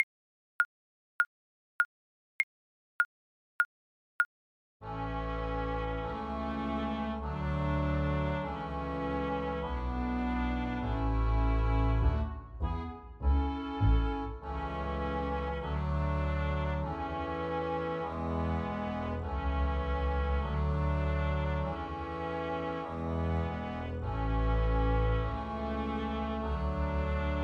twinkle twinkle viola

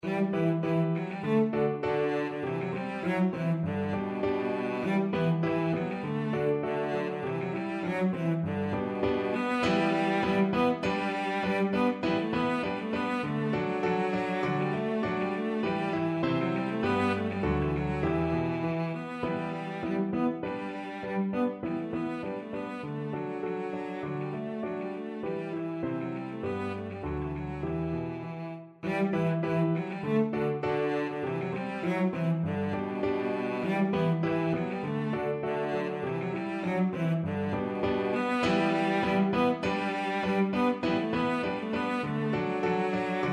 cello

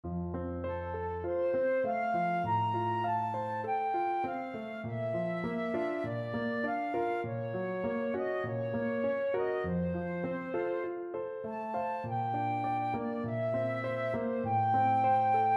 brahms wie melodien flute

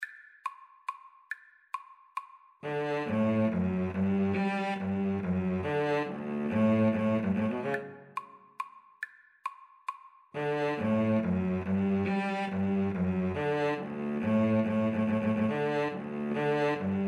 will you come in, Violin Cello Duet

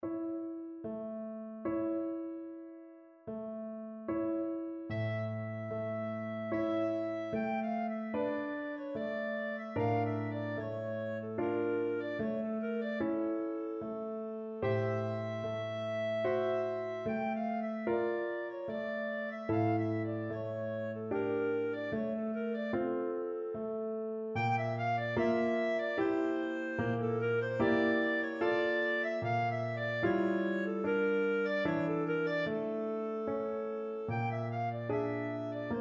bartok romanian folkdance clarinet

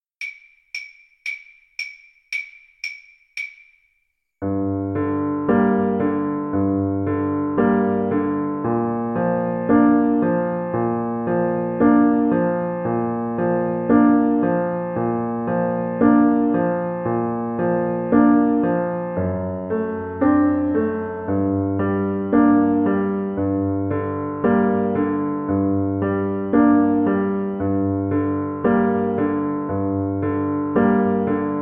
viola

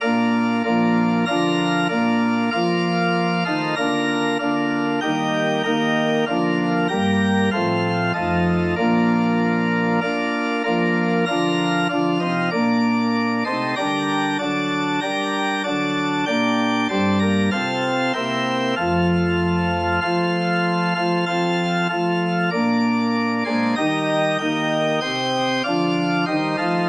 angels from the realms, organ

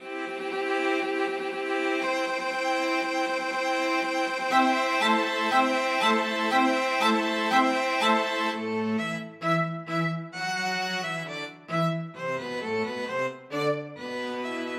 string quartet

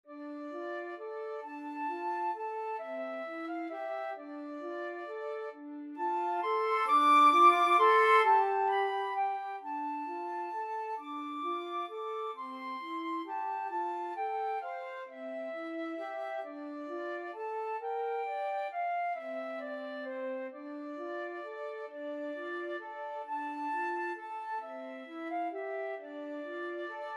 scarborough fair flute duet

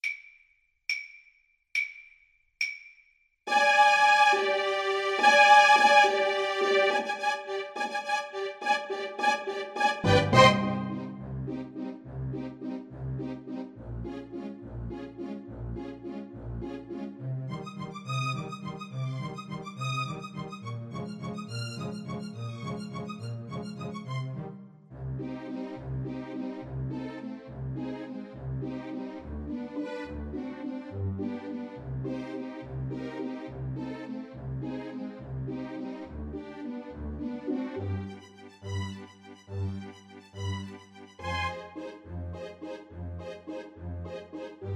Saxophone; ALTO SAX

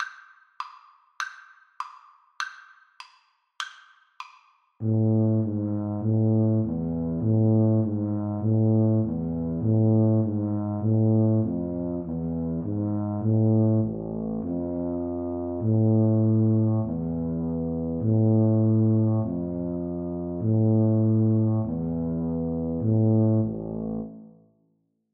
Tuba duet

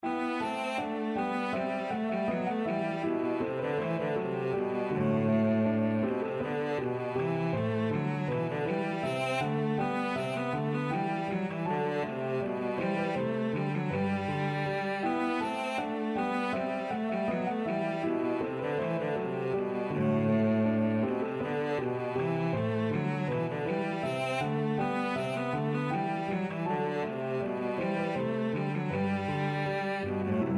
bourree, cello

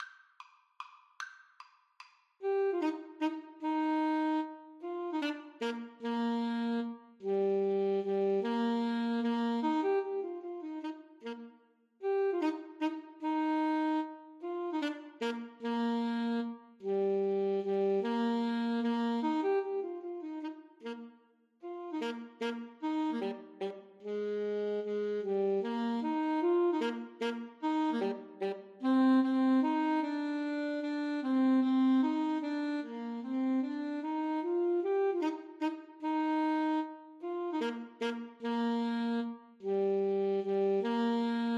Alto Saxophone Duet

